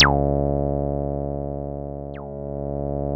C3, synth; 90 s Sample CDs; NiceBeats; SynthLine VOL-29

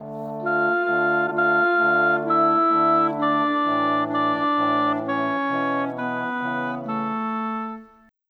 Brass Winds, Orchestral; Sonic Foundry (Sony Creative Software) - Orchestral VOL-2; Modern 38

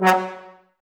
BRS TBS F#, Woodwind Brass Ensemble, Brass; Miroslav Vitous - Woodwind Brass Ensemble

